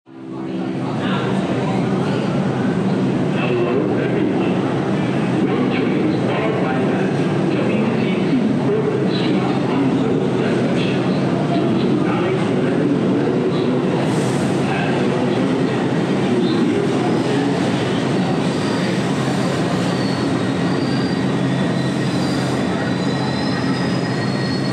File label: nyc subway skipping wtc